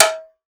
cleaned recording, snare

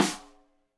Rim Shot Stereo; Deeply Sampled Drums; SMDrum

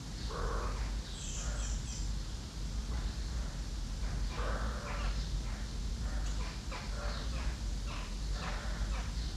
Ambience Loop, outdoor

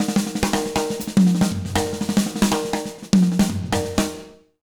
BEAT, Jazz Latin Drumloops

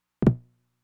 flam, Roland TR-909; Roland TR-909 Ableton Project; Processed; Crop

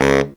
BARITONE FX; Zero-G; Phantom Horns

